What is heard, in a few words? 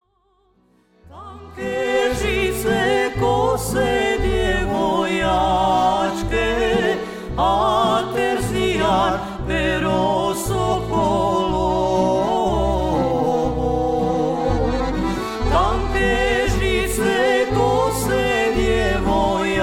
tamburalo; sevdah